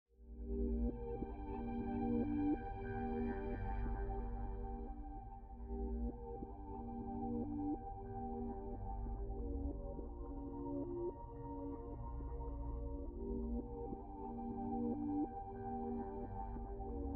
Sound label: synth